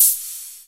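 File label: hard button high close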